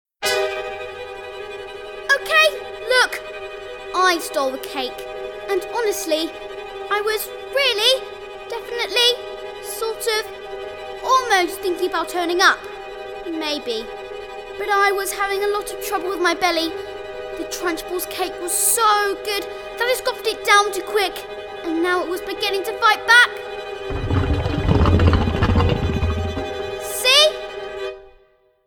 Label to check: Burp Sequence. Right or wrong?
Right